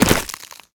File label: ice fall; Golden Crayon Sounds